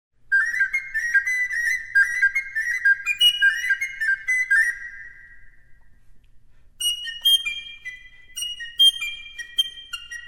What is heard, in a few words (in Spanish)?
Bop Fl Pico Sopranino; MusicadeCamara